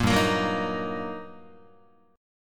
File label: G Minor Major 7th Sharp 5th, G, down, Guitar, 4A 4E 5A 5D 5G sharp 6C sharp; GmM7#5 strummed; JGuitar